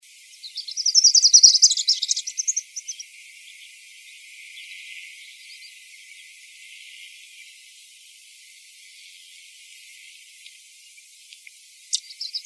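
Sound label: Warbler; Vermivora Parula Dendroica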